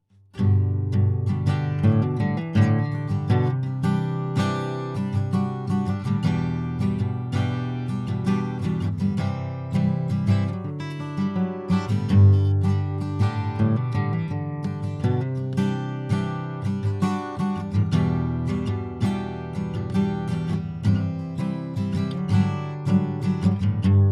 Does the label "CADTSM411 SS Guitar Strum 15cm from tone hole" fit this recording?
Yes